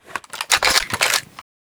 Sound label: rg6 reload, weapons